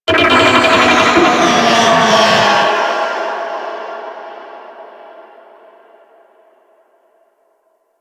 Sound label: Infested Golem Vocal Attack; Warframe